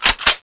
bolt pull, weapons; cso; turbulent7

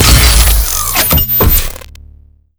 gauss sniper fire; doom gauss

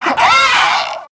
pain, zomb female; nmr

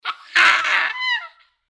gecko painvox; npc

